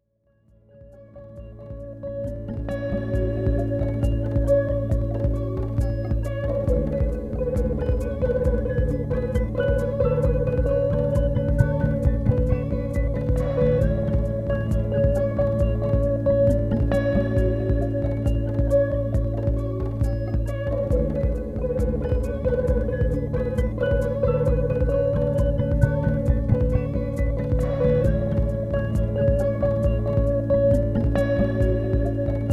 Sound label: loop